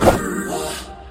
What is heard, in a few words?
pop flurish